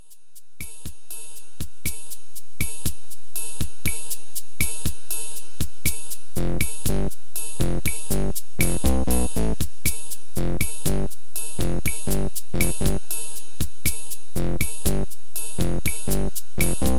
bossa nova

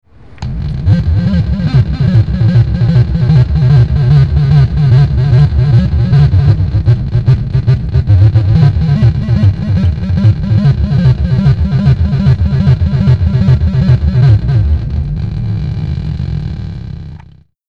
flashing tiger beep

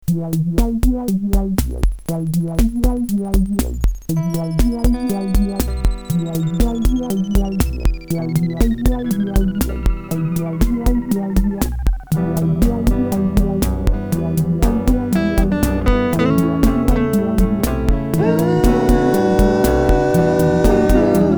night wobble

